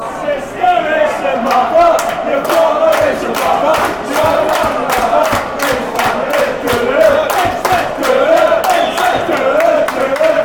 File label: song watford, fanchants